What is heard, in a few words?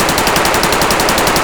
aksu distant indoor; loop; Escape from Tarkov